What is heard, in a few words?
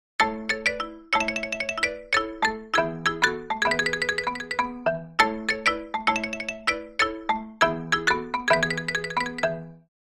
orchestral mallet marimba